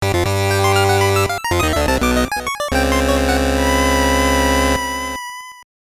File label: Jingle; Bad Batsumaru Robo Battle; GBC